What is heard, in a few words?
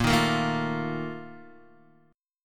Bb Major 7th Suspended 4th, Guitar, DADGAD